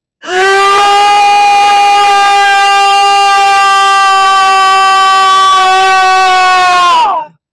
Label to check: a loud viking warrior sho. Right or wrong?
Right